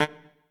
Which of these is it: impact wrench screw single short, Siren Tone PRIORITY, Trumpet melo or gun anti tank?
Trumpet melo